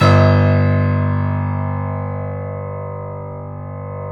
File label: KEY, Steinway ff; Roland L-CD701